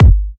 Kicks Electro

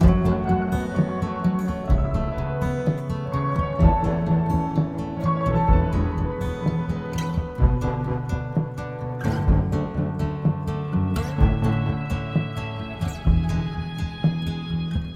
guitar, Loops, Sad

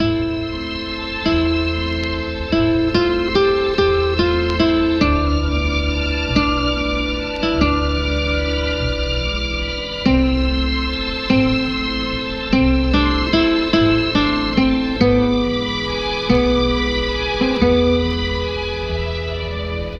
strings guitar overtop, Sad; Loops

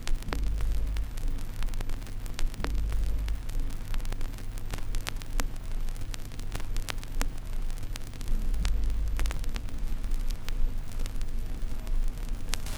crackles, Vinyl; Misc Percussion

